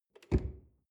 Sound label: drawer large close lock